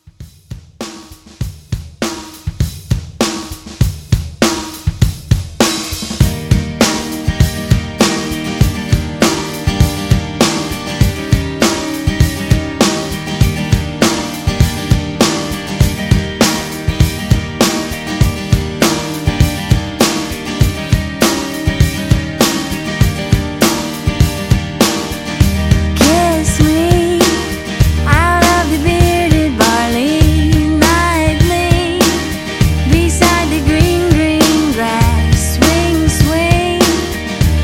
90s Pop Re Drum 100

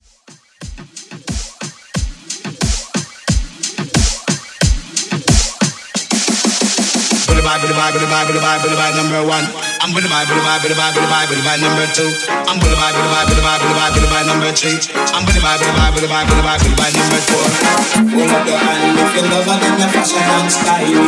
Dubstep Trap Redrum; Remixfreakz

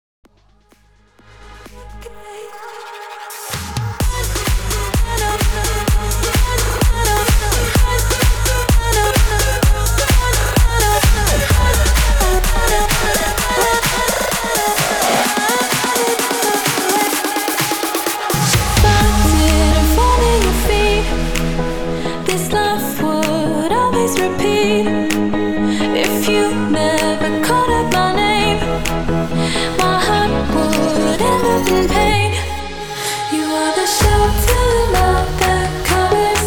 128 BPM

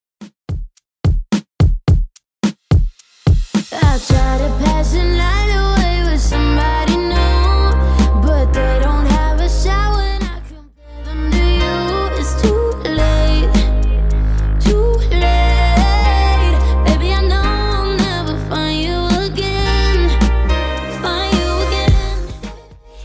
Top40 Redrum 108bpm

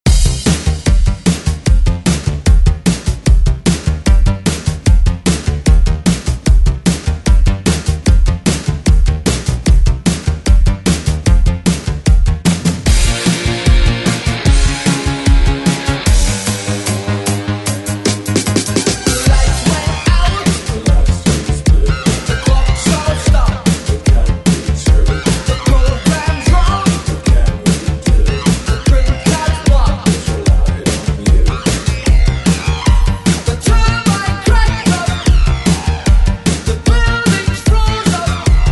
80s ReDrum; DJ Mhark

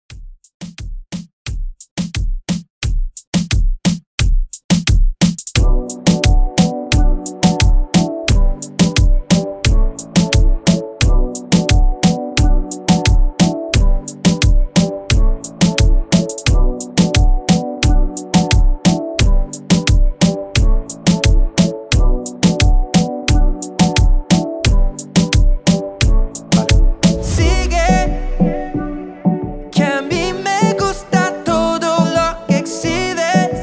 REDRUM, 88 BPM

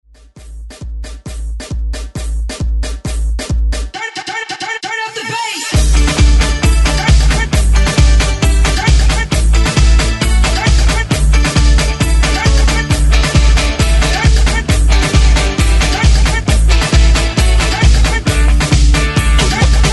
80s Hype Re Drum 134Bpm; DJDOLLS

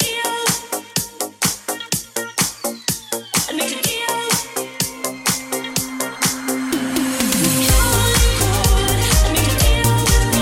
Happy House 125bpm Cm

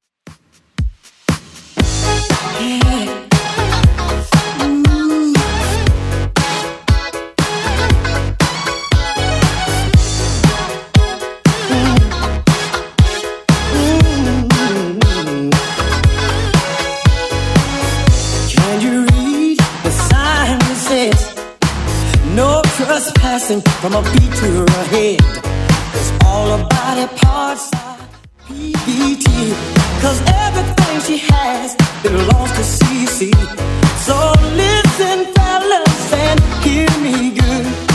80s Redrum, 118 bpm

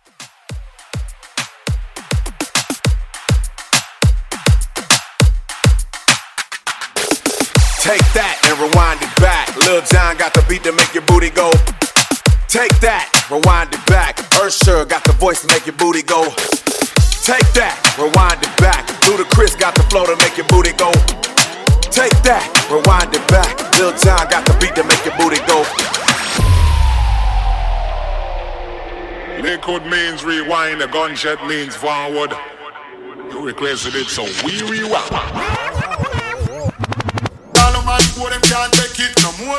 Dancehall; Rewind Edit; Dj Braindead

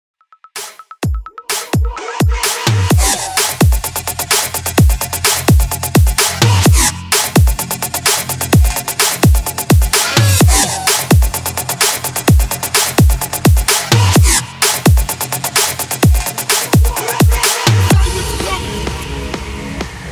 HALLOWEEN 128 BPM

